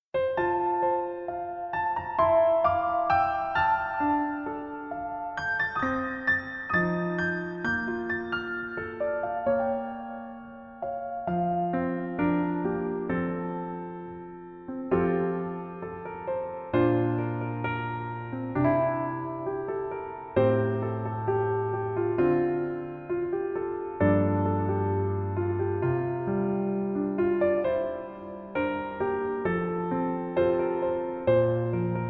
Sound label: Piano ver, 멜로디MR